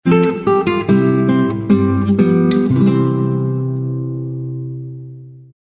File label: classical guitar accent medieval